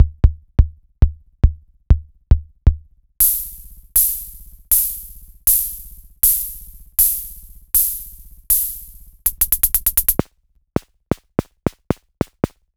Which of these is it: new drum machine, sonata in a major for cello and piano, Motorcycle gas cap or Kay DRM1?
Kay DRM1